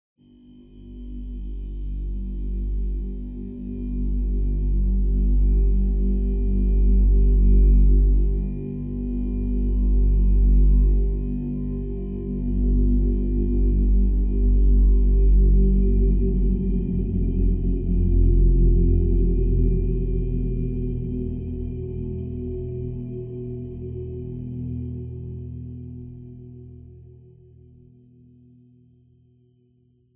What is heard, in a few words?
ui intro atmosphere; The Eternal Cylinder ps4 ps5 windows xbox one xbox series xs gamerip 2021